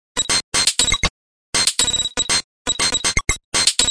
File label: 120 bpm, coin operated analog ouch, drum loops and breaks